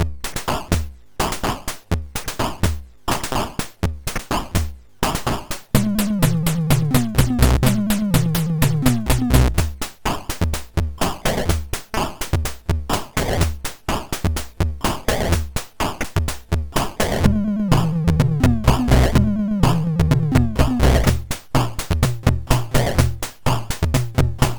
Drum, MOS6581R4

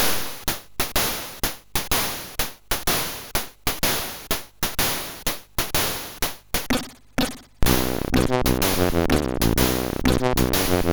Jazz

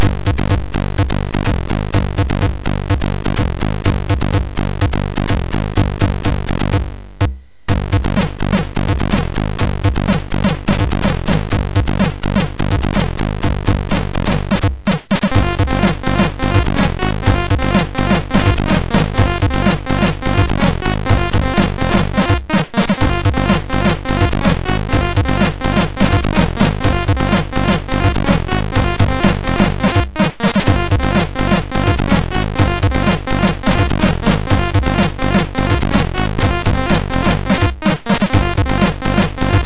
Techno Rave, MOS6581R3

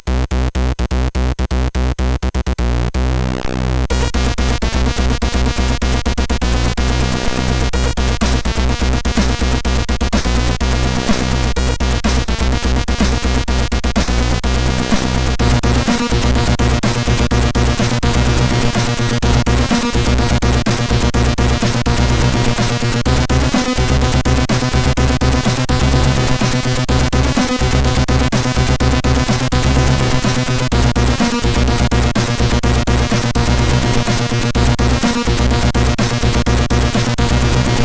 Lame tekkno, CSG8580R5; Cyke

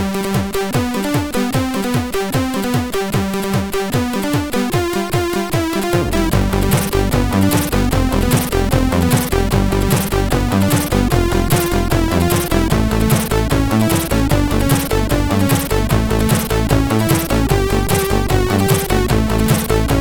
Swing, MOS6581R4

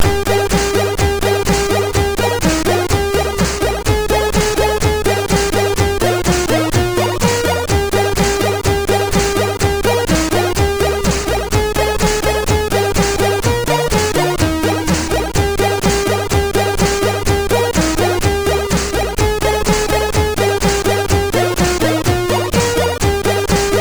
Jingle Bells, MOS6581R2

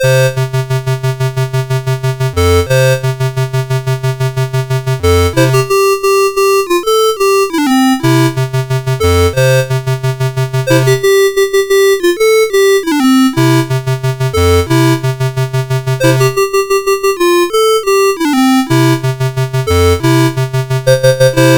CSG8580R5; Suicidal Software

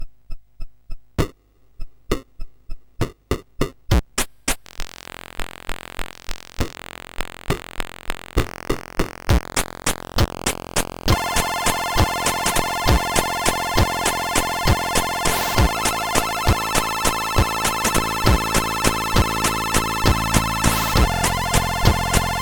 3 Bit Chip, CSG8580R5

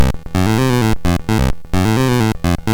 Bass, sid, CSG8580R5; hvsc

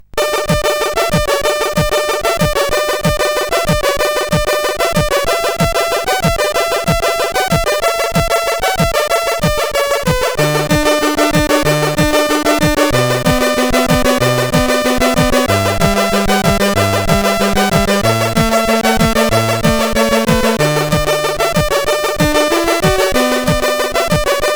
Zene,sid CSG8580R5

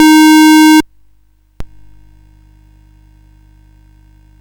Dragonworld sid CSG8580R5

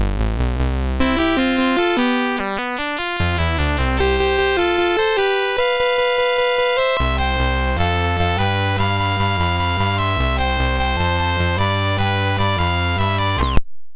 La Bamba, MOS6581R3; Suicidal Software

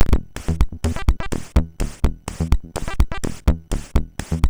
Dance, sid MOS6581R3; Odkin

